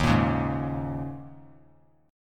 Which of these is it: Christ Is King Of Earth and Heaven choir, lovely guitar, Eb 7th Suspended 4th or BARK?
Eb 7th Suspended 4th